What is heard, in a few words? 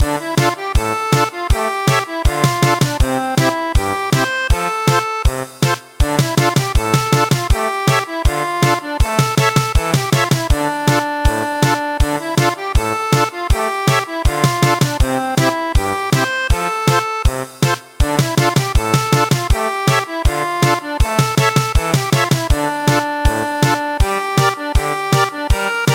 German Polka